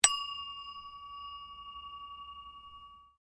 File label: Belltree Bell, Small percussion bells; Bells (Sound Effects:Musical)